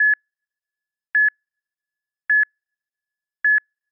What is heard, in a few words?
heart rate monitor